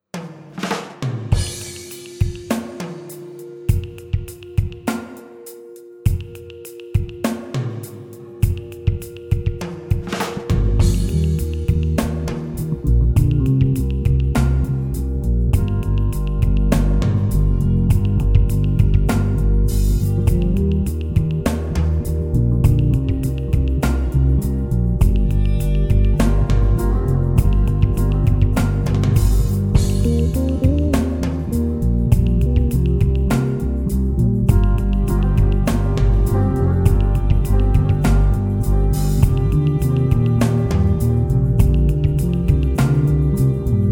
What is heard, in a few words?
Drums Ambience